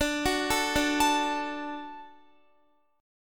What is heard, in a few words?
D Major, D, down, Guitar; arpeggiated; 4E,4A,5D,5G,5C,6F; chord